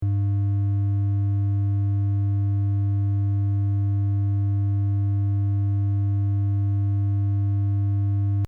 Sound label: A 100Hz GAIN 20dB